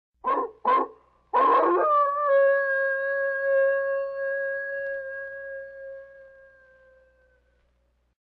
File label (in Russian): voy oborotnia; Страшные звуки для монтажа